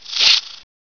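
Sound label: scorpion touchdown; svencoop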